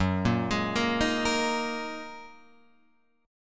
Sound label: Gb 6th Add 9th Gb down Guitar Open F; arpeggiated; Gb6add9; JGuitar